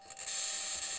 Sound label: mic, mars2020 edlcam